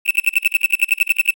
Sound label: Morning Alarm Digital Chirping; Unrealsfx; Motion Video